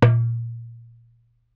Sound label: darbuka note